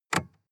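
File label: sports car interior lightswitch double short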